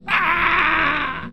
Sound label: gothic death